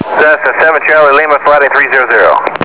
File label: fly